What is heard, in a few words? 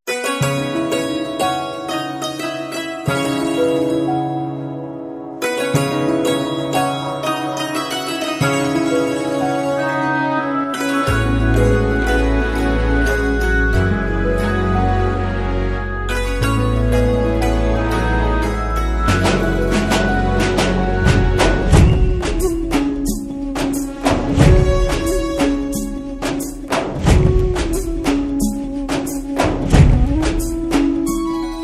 Instrumental Ringtone